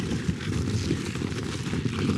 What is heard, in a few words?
reel spin; rome